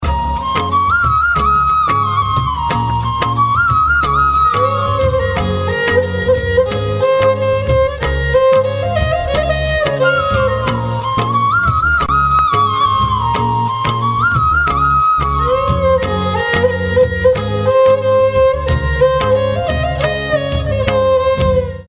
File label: music